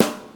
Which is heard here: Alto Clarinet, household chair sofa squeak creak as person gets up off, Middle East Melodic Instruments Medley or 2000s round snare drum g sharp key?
2000s round snare drum g sharp key